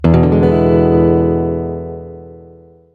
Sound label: e7 open, chord box